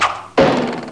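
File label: FAST ARROW; RpgMaker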